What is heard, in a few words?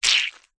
horror gore fresh fleshy guts bloody squeeze squelch